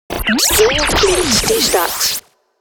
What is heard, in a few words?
Jingles Shotgun; Radio Geretsried